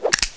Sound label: deagle draw, weapons; svencoop; AoMClassic